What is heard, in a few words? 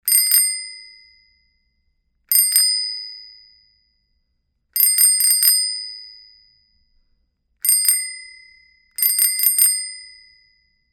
bicycle bell